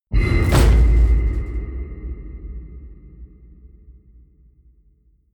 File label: metal crash hollow ring out small